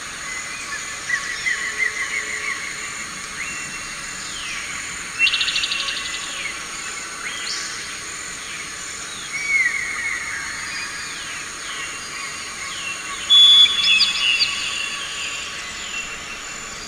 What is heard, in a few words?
Ambience (Jungle); donkey kong country returns 3ds wii wii u 2010